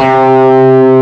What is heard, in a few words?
C-2 5 OVER D, GUITAR; AKAI S-Series CD-ROM Sound Library VOL-8